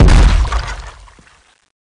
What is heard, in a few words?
hang exp; kolmods proj impact